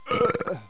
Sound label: people